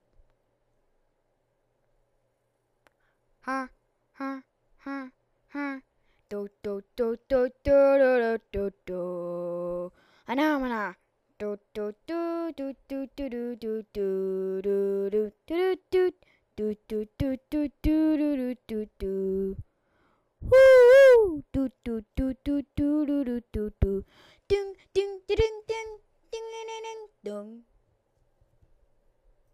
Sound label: ocean blues